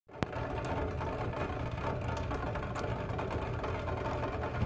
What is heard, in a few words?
drain